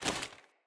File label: Foley Action Armour Run; Mother Armour